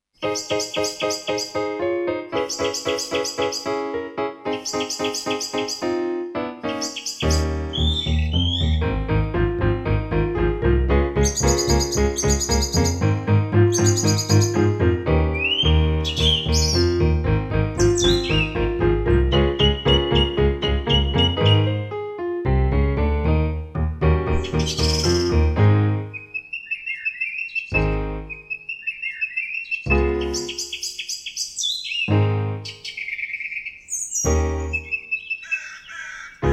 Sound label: Drossel Blues